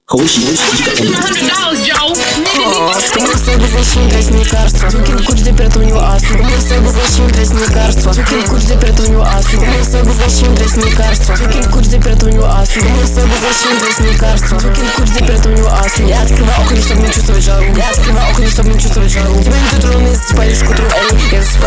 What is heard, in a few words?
Perc